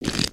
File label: Pet Dragon Scratch, creatures; creatures pets